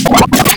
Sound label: Audio Corruption, Electronic